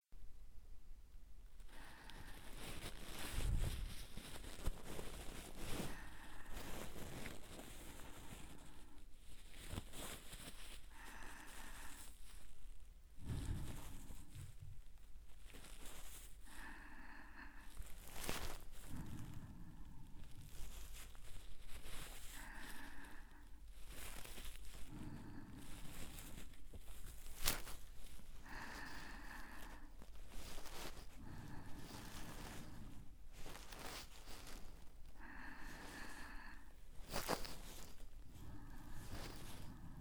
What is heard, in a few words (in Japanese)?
耳かき（右耳）, ASMR